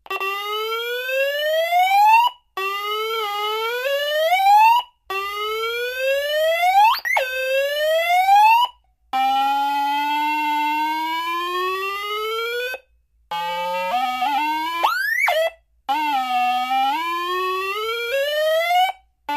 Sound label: Alarm Car Type 3; Modulating Ascending Tone Slows Down And Speeds Up, Dies At End